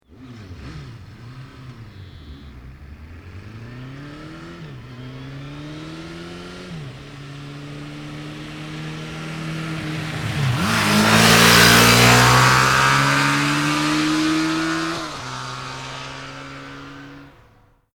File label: Motorbike Suzuki 1100 Up and Pass Fast; Motorcycles